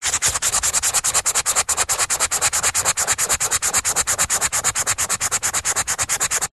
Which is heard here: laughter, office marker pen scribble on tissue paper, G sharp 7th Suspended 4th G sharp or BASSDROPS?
office marker pen scribble on tissue paper